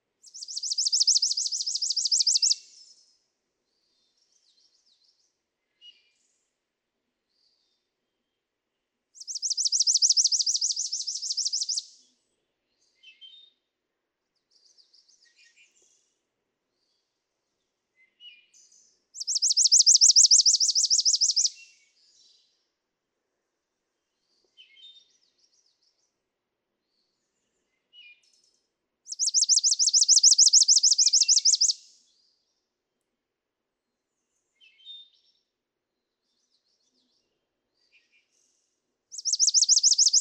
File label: Chipping Sparrow